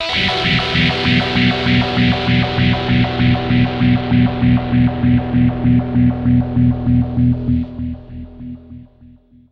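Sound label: dirty guitar stereo polyphase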